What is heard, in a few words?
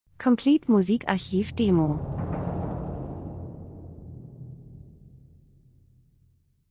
drums; Timpani Wirbel